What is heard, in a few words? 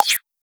Jump